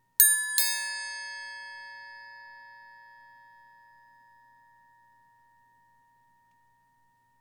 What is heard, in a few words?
Triangle Bell Door